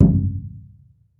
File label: TOLOWA, American Natives, Earth Tones; E-MU Formula 4000 Series Vol. 4